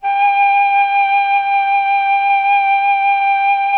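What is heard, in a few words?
Mellotron flute G5; MelloFlute; Oldies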